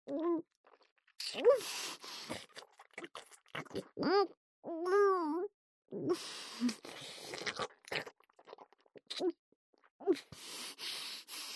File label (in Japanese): ディープキス; ディープキスレイプ（由紀）; キスループ; おまけループ（オナサポ）